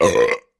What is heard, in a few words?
Belch Deep